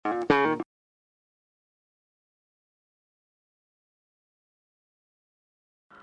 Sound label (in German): filmmusik